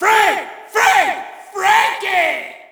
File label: Shout, audience; UFC Tapout 2